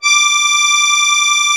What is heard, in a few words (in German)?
MUSETTE D3, akkordeon; Best Service; Alpine Volksmusik